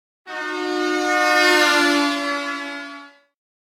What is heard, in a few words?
Semi Truck Horn Doppler By - Medium Speed Passing Truck Horn R to L; Hollywood Edge; sound designer's toolkit v2